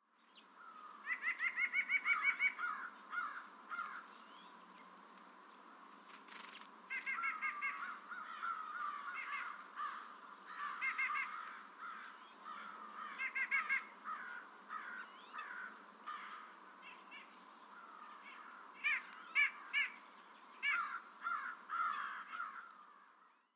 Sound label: White-breasted Nuthatch song and calls